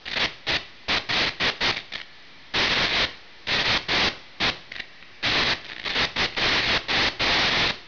sparks, ambience

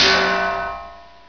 clanger; ambience

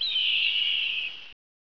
western eagle cry; ambience

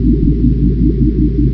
ambience

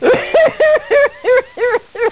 hehehehe

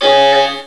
warn

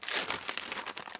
paper

